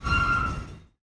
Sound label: Train Stop